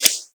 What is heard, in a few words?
UI Login Reward Cycle